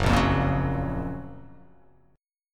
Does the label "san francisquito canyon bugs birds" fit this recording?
No